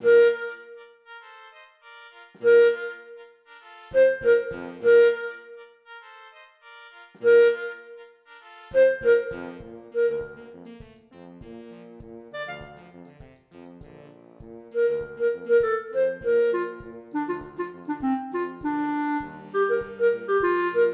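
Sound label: clarinet; Borgertog2019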